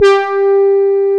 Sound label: HO G4, ANA HRN